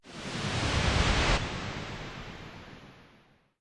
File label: convert World; World Of Goo